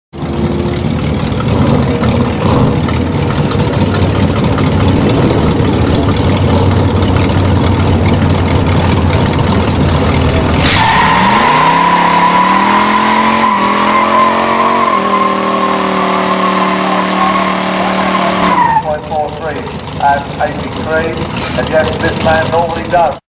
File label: Chevy Camaro